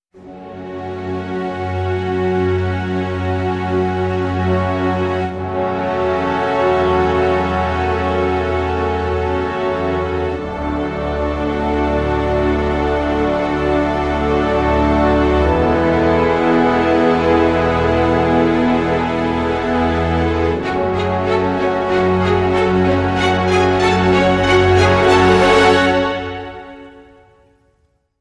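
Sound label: Hybrid Sting, Orchestra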